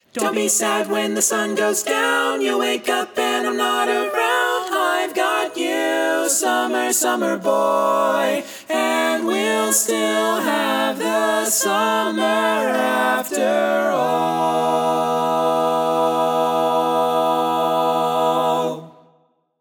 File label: All Parts, Barbershop Tags